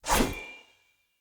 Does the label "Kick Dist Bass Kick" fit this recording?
No